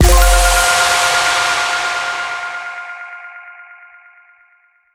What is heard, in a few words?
Processing Complete